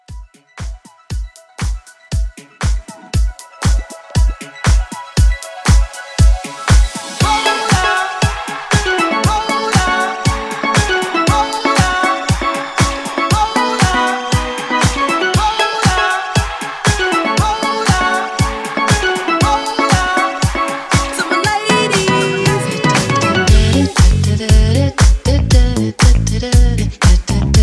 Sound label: Mixshow Hype 118